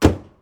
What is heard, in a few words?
Car Boot Close